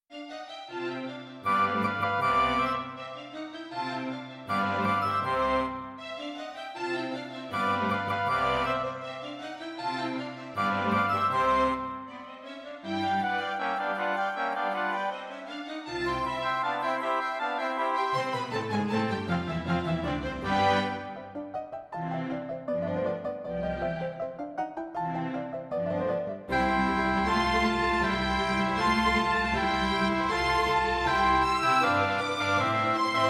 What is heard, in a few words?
Roland JV-1080; classical; Mozart; Concerto K467 partial